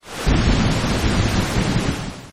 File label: rain; huamajiang